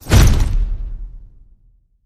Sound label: Giant Troll Foot Stomp